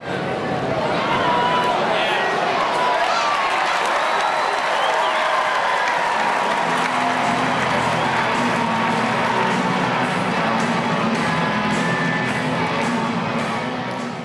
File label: Baseball Crowd React Charge; Crowds